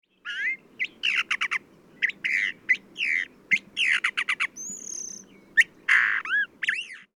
California Thrasher, bird songs

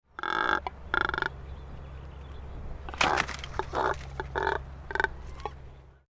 Double crested Comorant, bird songs

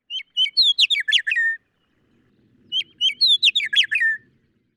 Western Meadowlark, bird songs

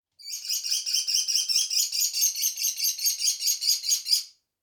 American Kestrel, bird songs

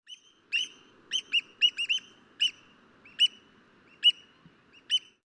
Black Oyster Catcher, bird songs

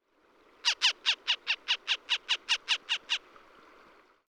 Northern Harrier, bird songs

